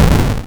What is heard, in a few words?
death; simple js snake